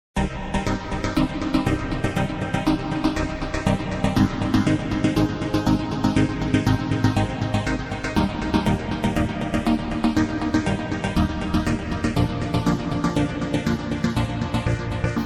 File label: Mod Breat